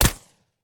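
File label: attack crossbow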